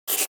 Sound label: Key Tone